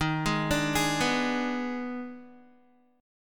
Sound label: Eb Major 7th Suspended 4th Sharp 5th, Guitar, EbM7sus4#5 chord; arpeggiated